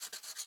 Sketch Env Write; Maze

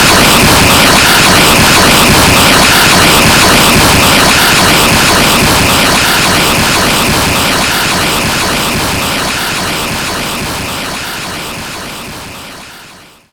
Sfx Wintergames

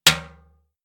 Shield Impact

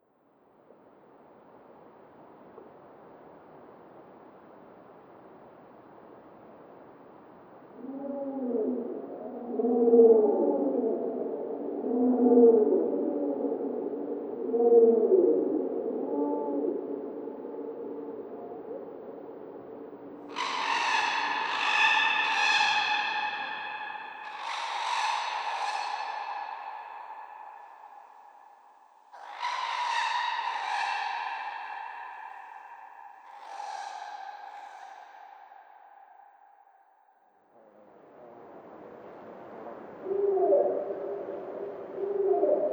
AMB Jungle Animals

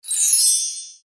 Hit; Night Hunter After Dark Chapter II

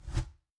woosh; Ion Shift

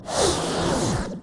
Music

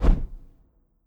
Hawk Flap

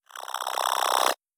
Jelly Charge; Legend of Keepers (gamerip) (2019)

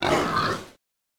Attack; Folk Hero

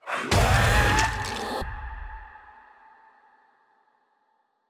Magus Fear

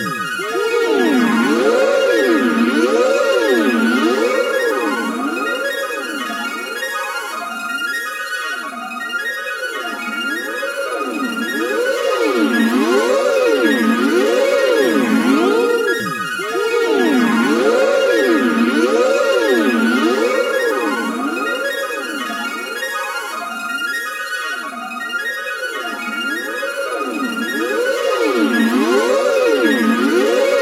Music Loop

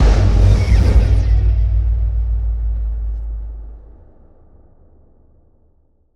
UI Splash Screen; Monster Jam Steel Titans

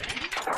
Armadillo Roll Finish; enemies; unsighted

